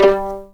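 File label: G3 pizzicato mezzo forte right, violins; East West; The Ultimate String Collection; VLNS PIZZ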